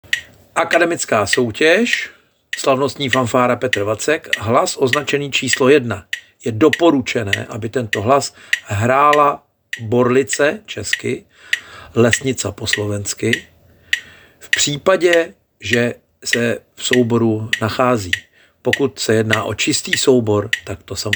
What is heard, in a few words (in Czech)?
Akademicka Slavnostni fanfara hlas 1 verze lesnice